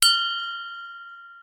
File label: bell; tortuga